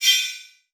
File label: Multimedia Internet CD-Rom Flash Ding